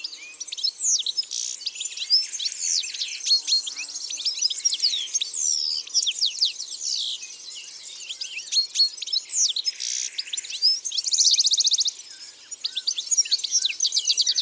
Chardonneret elegant; Isere; Concerts naturels de l'Isere; Studio Les Trois Becs

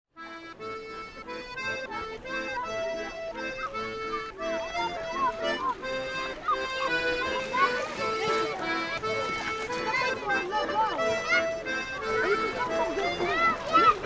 Souvenir de baignade Ambiances Sonores d Ardèche; Studio Les Trois Becs

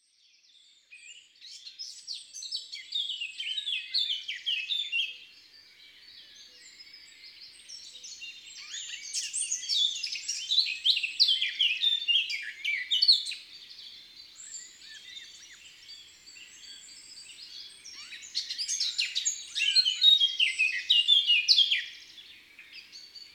Bois et forêts

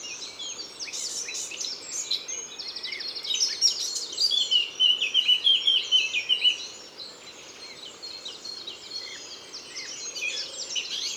Fauvette a tete noire; Concerts Naturels de la Drome; Studio les Trois Becs

